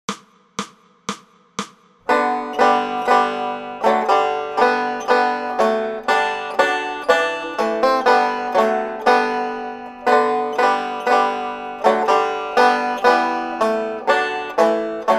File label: Banjo; Hark! The Hearald Angels Sing; Banjo Tablature with Play-along Audio Tracks